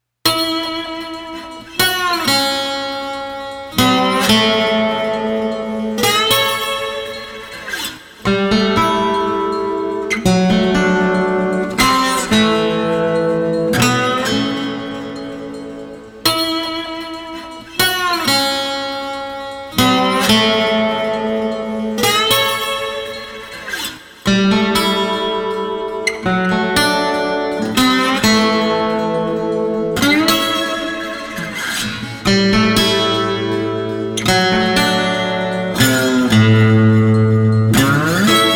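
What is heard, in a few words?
Street Guitar Melancholy Slide, 120 bpm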